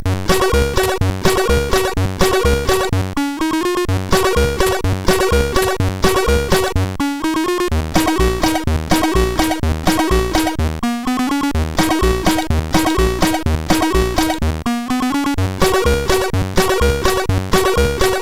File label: Fast Food, MOS6581R4